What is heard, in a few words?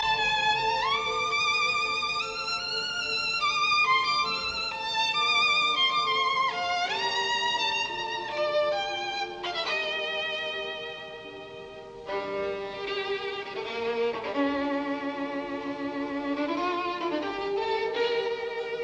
Violin Concerto in D minor, Allegro moderato; Sibelius; Op 47; Jascha Heifetz